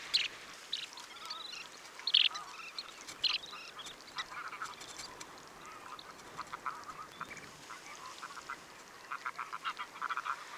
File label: Curlew sandpiper; پرنده تلیله بلوطی